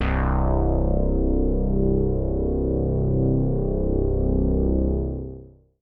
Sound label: BASS Bright (C); Melodic One Shots; Cymatics; GHOST (Beta Pack)